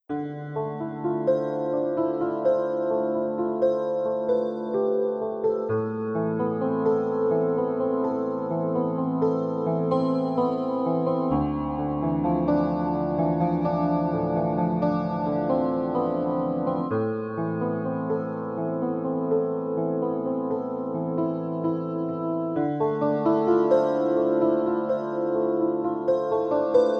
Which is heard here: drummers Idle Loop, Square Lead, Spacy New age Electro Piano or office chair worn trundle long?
Spacy New age Electro Piano